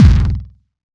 Kicks Repeating; Drum Hits; Loopmasters; Jon Carter & Alex Blanco; Block Party Essentials

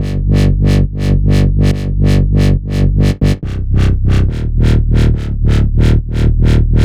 140 B BASSLINE, Dubstep

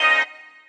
Eb STAB, funky

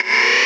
B STAB, funky

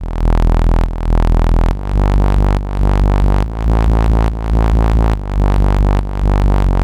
140 E BASSLINE, Dubstep; Loopmasters; The New Sound Of Dubstep; BASS LOOPS

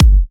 Bassdrum

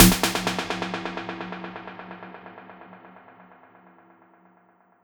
FX Impact

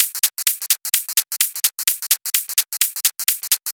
Loop, 128 BPM

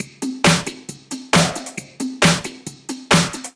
135 DRUMLOOP; Loopmasters; Booty Breaks Vol.2

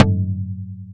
Toms

